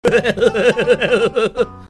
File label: haha